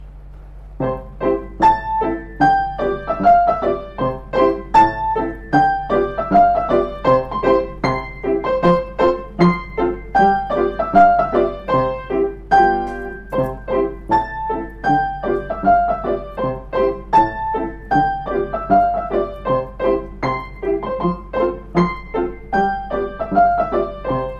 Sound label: Tap Shoe Dance duet; E. Mae. Burnam